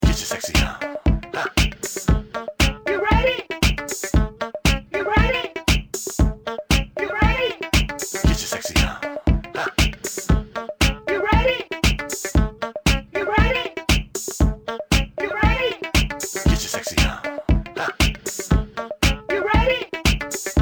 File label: interlude